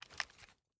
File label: shoulder, weapons; mas scar; cw20 extras